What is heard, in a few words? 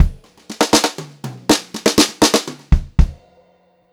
FILL, Brushed Drum Loops; USB Soundscan vol.39; AKAI